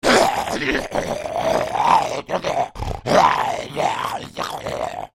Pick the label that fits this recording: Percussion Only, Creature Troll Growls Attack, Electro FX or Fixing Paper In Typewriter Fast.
Creature Troll Growls Attack